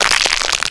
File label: Huge crack